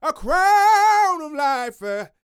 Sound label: Vocal Gospel; Vocal Planet Vol1 Gospel